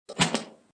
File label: Quick strike of a leather whip.; Objects